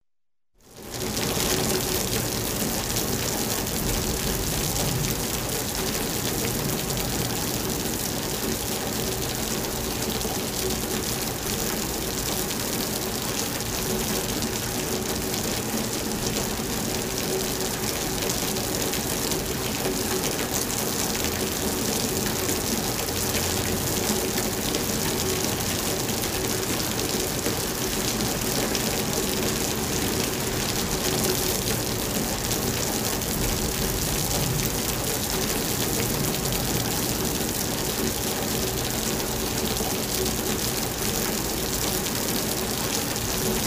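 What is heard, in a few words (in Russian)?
крыльцо веранда легкий дождь капает с крыши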